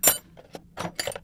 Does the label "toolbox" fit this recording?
Yes